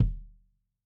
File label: KICK MED MED KICK; Sonimus; a series drum pack; A-CONSOLE; A-EQ